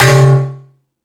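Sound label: Jungle Percussion, Drum Machines; 300 Drum Machines; E-MU Pro-Cussion-1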